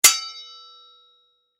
Sword Strike Impact Hit Ring; Warfare; Swords (Sound Effects:Combat); Blastwave

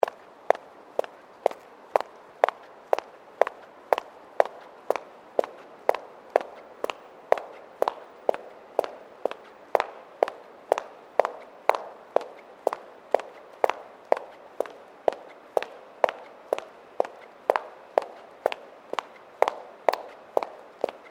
FOOTSTEPS WOMENS HIGH HEELS CONCRETE SEQUENCE; Footstep Foley; Human

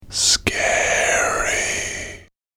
Scary Sinister Voice, devil voice

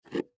Jar Pick Up Wood Surface Glass; Food Sound Effects; Household; Foley

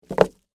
Rope Drop Wood, Surface, Heavy, Rope; Hand Tool Sound Effects; BlastWave

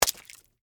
Crunch Crack Egg, Shell; Texture, Vegetable

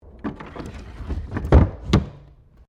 Train Carriages 1937 Window Close; Bellarine Tourist railway vehicles

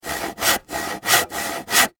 Industry Handsaw Cut Wood Saw; Saws; Machines

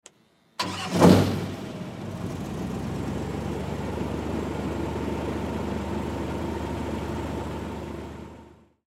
Air Boat Rev; Air Boat Start Up Idle; Air Boat Engine; Transportation Watercraft; Boat Sound Effects; Boats (Sound Effects:Vehicles); Blastwave

